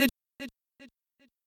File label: voice